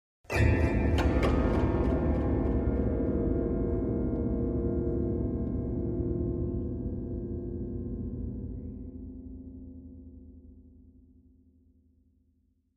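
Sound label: Grand Piano Strings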